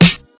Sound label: airy sizzle snare one shot f key, steel snare drum tuned to the F note; snare drum samples